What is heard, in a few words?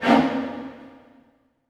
HIT, Orchestral; Orchestral Loops; 128 PSYCHO